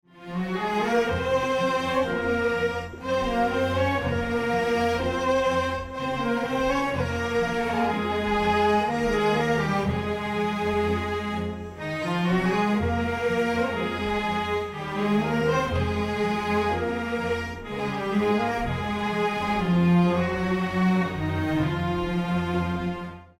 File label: Shahrzad Ringtone